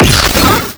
Swarm Shoot; Swarm SFX

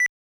cursor; SMAPH CURSOR

